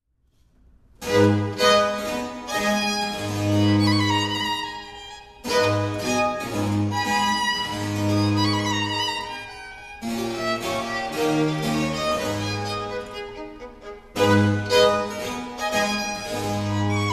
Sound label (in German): violinsonaten